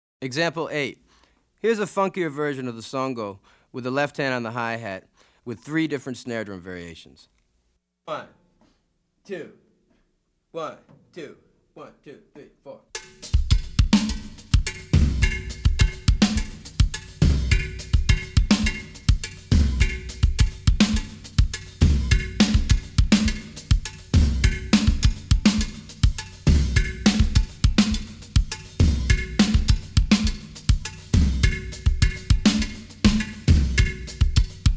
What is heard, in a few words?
Afro-Cuban Grooves For Bass And Drums; Drummer version; Goines, Ameen